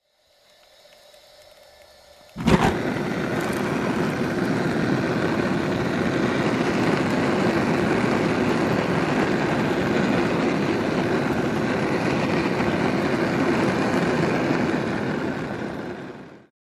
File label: Leaking gas catches fire